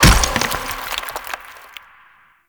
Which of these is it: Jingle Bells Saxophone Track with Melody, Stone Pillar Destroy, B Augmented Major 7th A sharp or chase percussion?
Stone Pillar Destroy